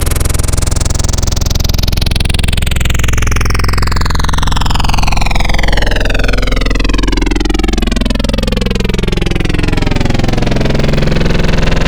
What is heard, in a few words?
Growl, Wavetables